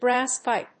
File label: brass pipe